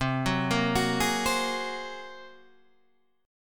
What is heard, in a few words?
A sharp 7th Suspended 2nd Sharp 5th C